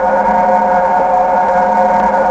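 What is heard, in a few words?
eng howl loop, ships engine; Quantum Redshift; darius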